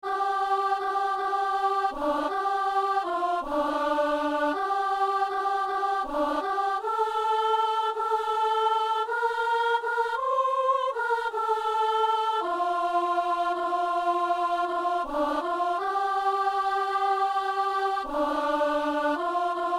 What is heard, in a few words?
soprano; Messe du 17 janvier à Saint-Denis